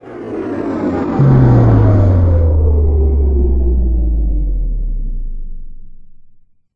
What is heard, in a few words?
Ferengi Exit Warp; NanoFXv2; WarpFX